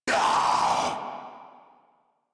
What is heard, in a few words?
Death Corrupted Grineer Male